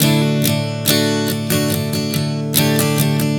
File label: Strum 070 D, One Chord Strumming 070 bpm; Acoustic Guitar; Sonic Foundry (Sony Creative Software); Songwriter Acoustic Guitar Companion